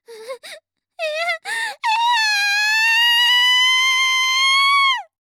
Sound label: w scream